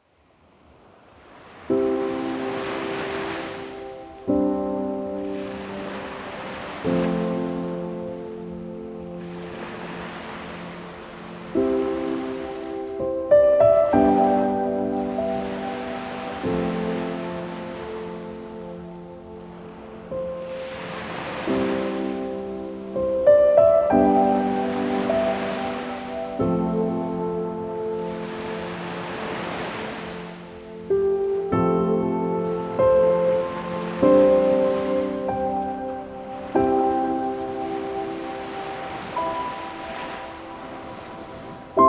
piano, zone out ocean waves

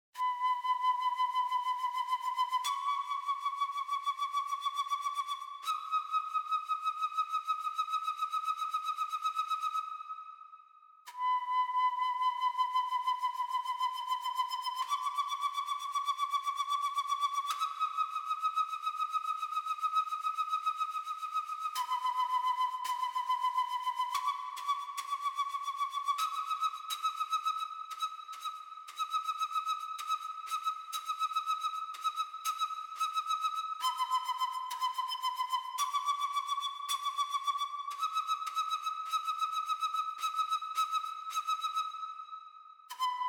native american flute

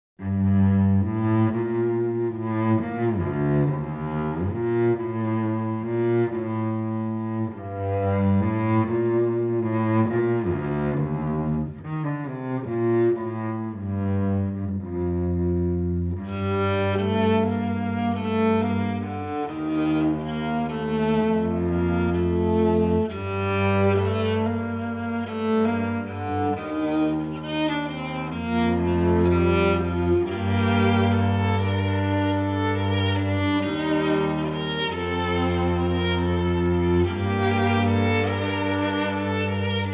string quartet

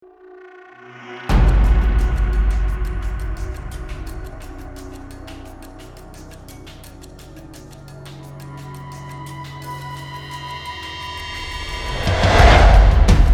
drums

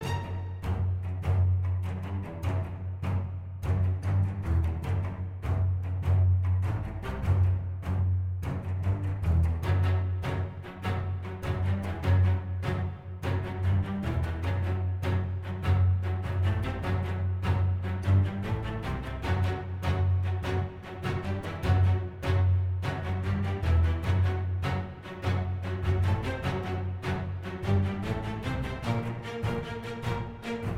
strings

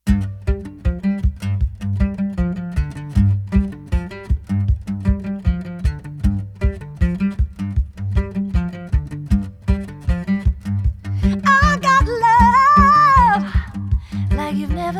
acoustic version

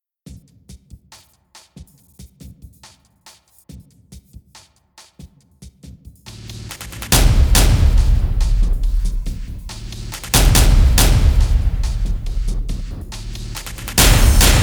percussion only

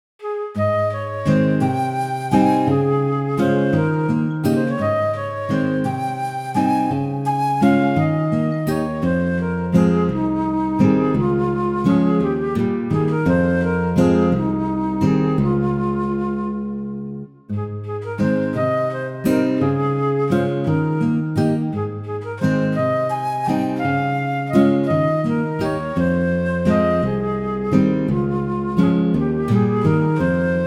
flute

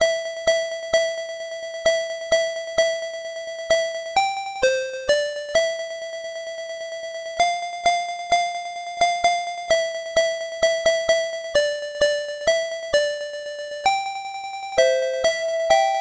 jingle bells instrumental

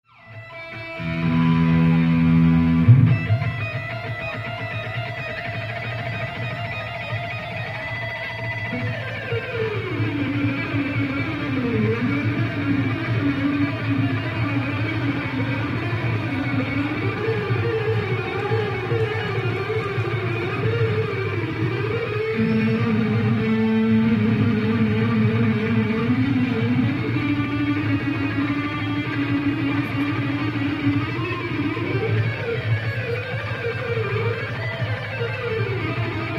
guitar solo

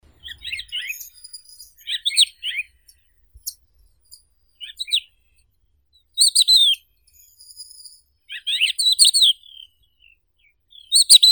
zhavoronok hohlatii galerida cristata

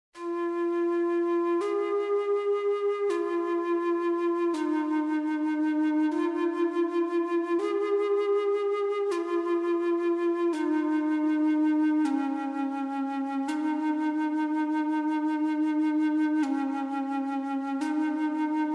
native american flute

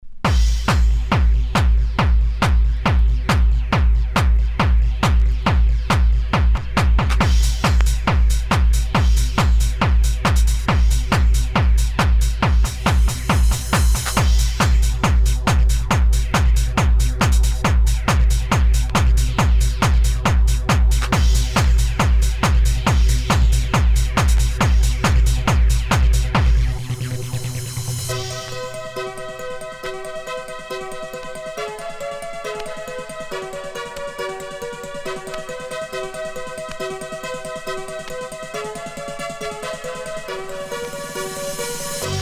jingle bells

